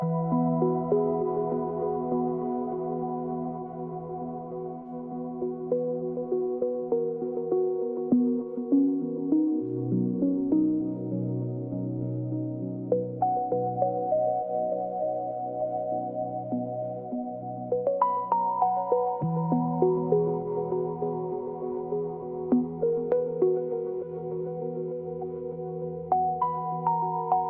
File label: music game